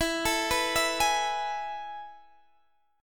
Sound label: A Major 7th Suspended 2nd